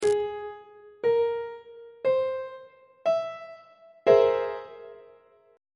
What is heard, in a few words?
d double flat augmented 7th 2nd inversion on treble clef